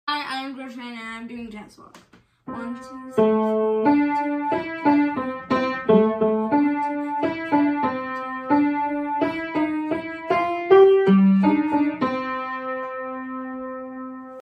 Jazz Walk, Recital

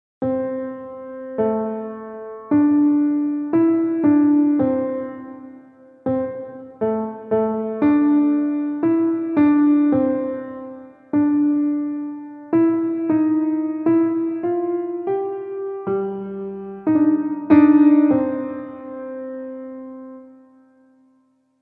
Jazz Walk

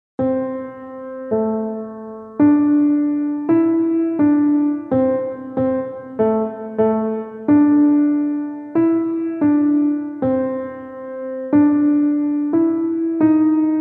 Jazz Walk

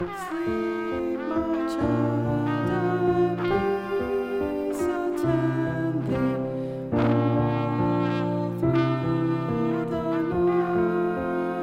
Vocals Trumpet; All Through The Night

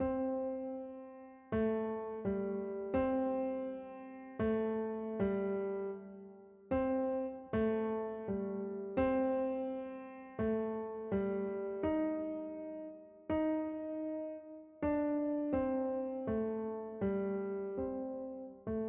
GrooveTune

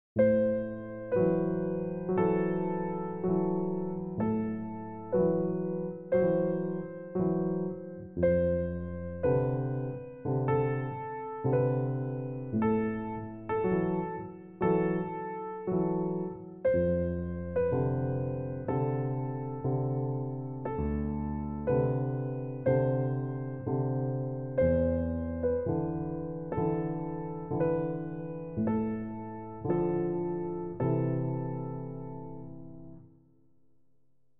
duet

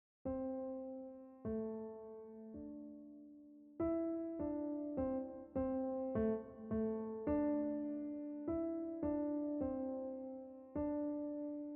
JazzWalk

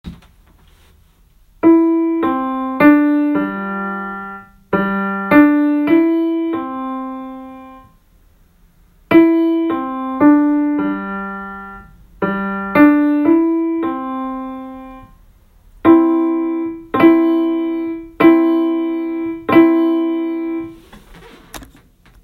Bell Tower, Home Recorded

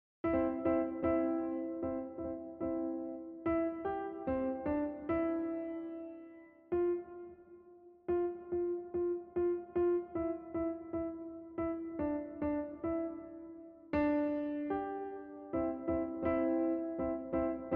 Jingle Bells

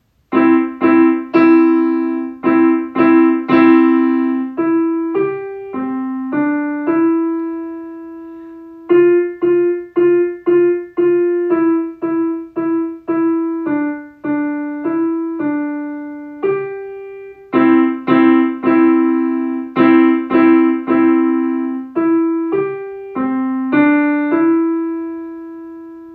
Jingle Bells, Home Recorded, student recordings

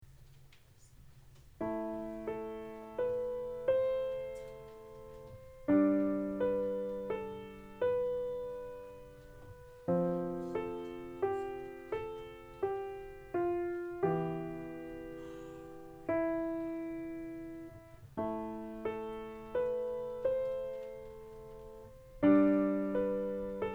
A Shepherds Flute, Recital; Richter

